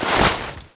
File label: Grenade throw